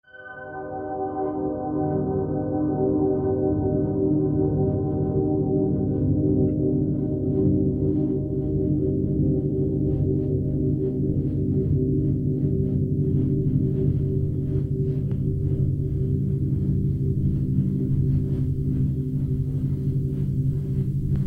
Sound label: ambient piano